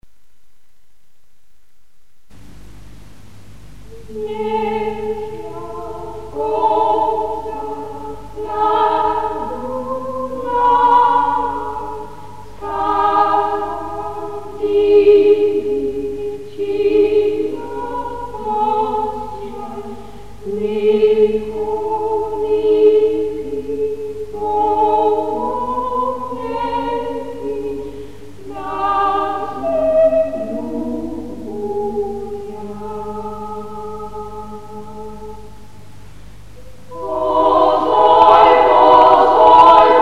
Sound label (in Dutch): Iets Russisch